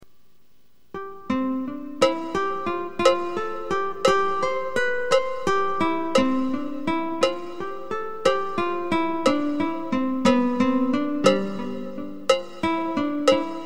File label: Guitar and cowbell